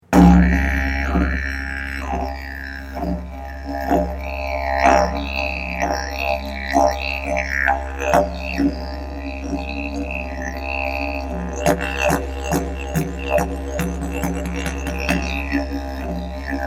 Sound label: didge